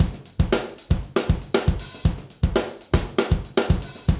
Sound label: BREAK; ZERO-G; Datafile